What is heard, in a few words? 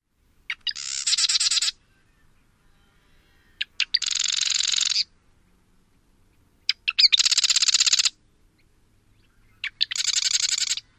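song western subspecies, marsh wren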